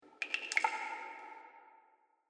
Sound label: Water Fantasy Cave Drips; Dripping Sound Effects; Agcnf Media